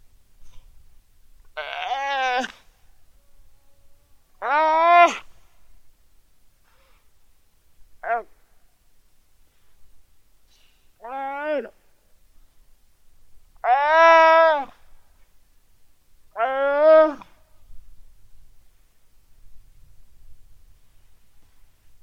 weddell seal pup calls

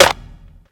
reverb snare f sharp key

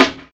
old school hot snare drum sample c key

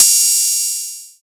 long room reverb crash cymbal drum sound f key; Cymbal drum one shot samples

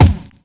bass drum E key

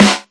short snare single hit g sharp key; snare drum samples

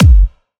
short reverb kick drum sound G key

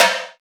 sizzle snare drum sample c key

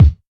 dry kick sample d key

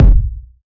deep kick E key, loudest frequency: 110Hz

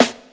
good acoustic snare sound b key; 90s

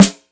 smooth snare drum g key; snare drum samples

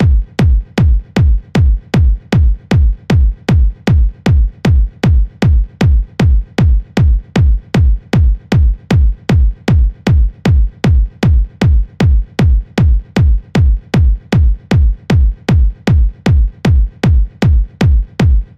kick; baucella; hardstyle kick loop wet

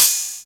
soft sounding open hat one shot g sharp key

